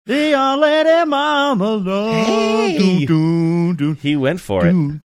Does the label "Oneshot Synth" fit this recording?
No